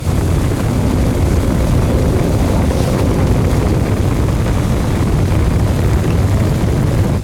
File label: Lava; Likho; Folk Hero